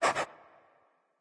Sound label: dog pant; smite; scylla